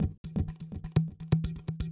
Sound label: PERCLOOP ETHNO 125